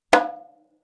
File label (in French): Bongo aigu claque